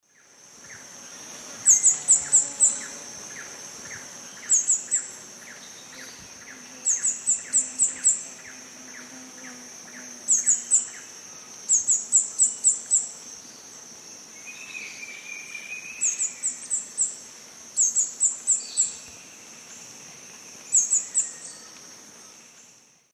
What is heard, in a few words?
Hylocharis cyanus, song; S. America; Eastern Lowlands